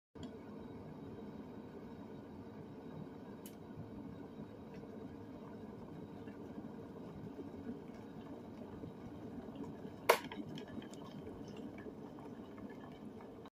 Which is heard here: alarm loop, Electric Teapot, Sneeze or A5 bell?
Electric Teapot